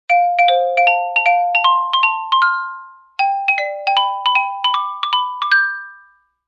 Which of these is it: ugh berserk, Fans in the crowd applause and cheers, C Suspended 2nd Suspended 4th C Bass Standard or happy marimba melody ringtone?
happy marimba melody ringtone